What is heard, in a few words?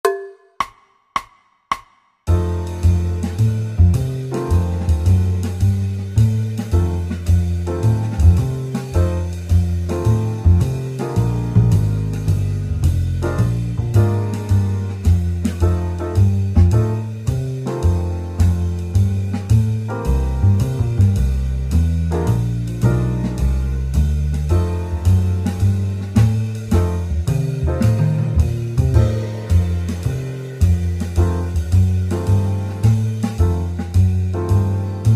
Basic Blues in F Swing 108; 12 Bar Jazz Solo Project “Swing Style”